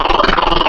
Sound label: Chipmunk, Wavetables; Cymatics; Serum; Animals for Serum - Gold Edition